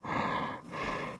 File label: Ogre Breathe; Timez Attack